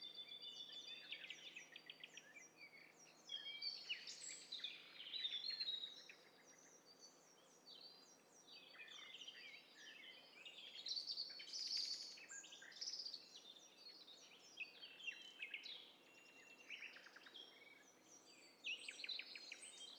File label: STEREO BIRD COCKTAIL PARTY